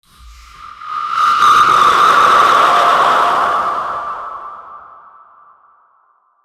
loud horror sting

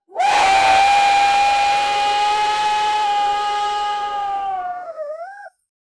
kaiju roaring loudly; film

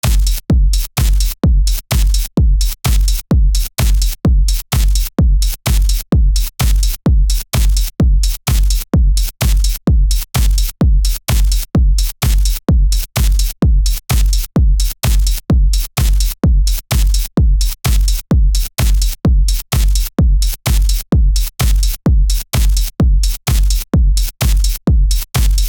intro of track in, progressive house; 128bpm

